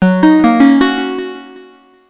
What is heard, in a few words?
School Pleasant